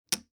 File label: Poker Chips; This Is the Police